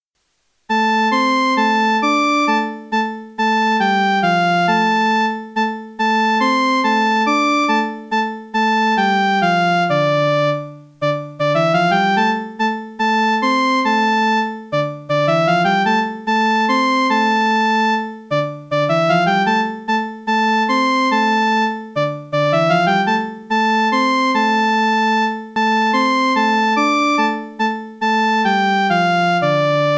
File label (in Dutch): muziek herfstliederen blaset die horner instrument eenstemmig